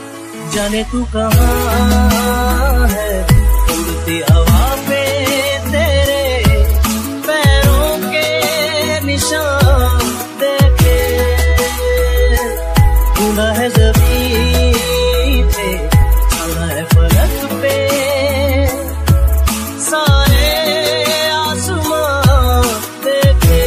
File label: mood off ringtone, bollywood ringtones